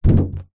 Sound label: foley balloon large inflated catch